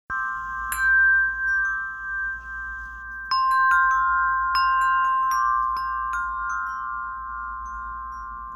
sand coloured tuned

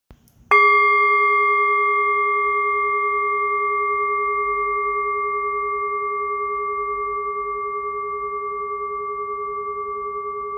hand hammered brass striker; Flower of Life Purple Rounded Meditation Singing Bowl – 8″D

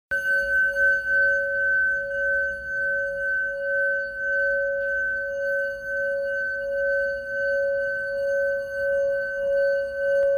plain brass C; singing bowl; Flower of Life Purple Rounded Meditation Singing Bowl – 8″D

